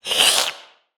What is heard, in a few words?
monster, creature